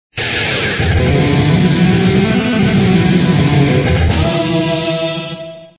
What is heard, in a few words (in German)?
klavier; cave